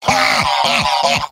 heavy laughter big; vo; tf2; mvm

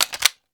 grenade launcher drum close; tf2

